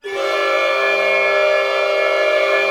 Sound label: ORC CLUST, Chord Cluster; String Master Series